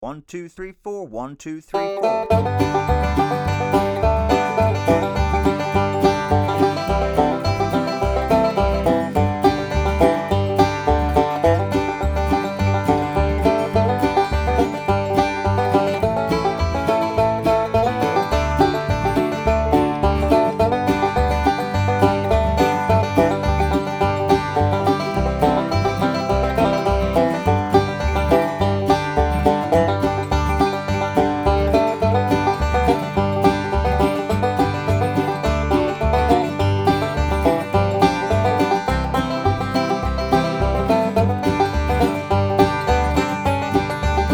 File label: Beginner Banjo 105 BPM